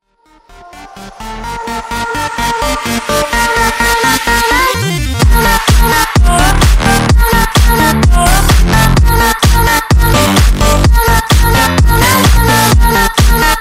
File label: electro house